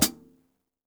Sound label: hihat - close; JazzFunkKit